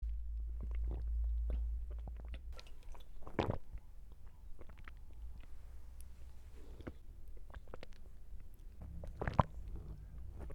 Swallowing Water

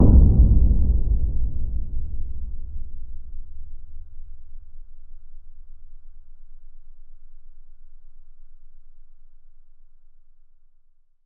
Oil Convector Hit

